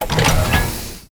doors open

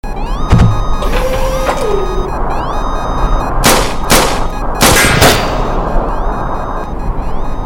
Thruster; Infiltrating The Airship

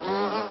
big bee prepare

